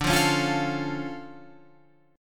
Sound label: D Minor Major 7th, D, Guitar, Open F; DmM7 strummed; JGuitar